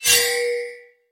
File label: DANGER PING, GAME SOUND; LOL